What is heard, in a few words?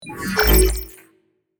Stealth spy game menu ui unlock item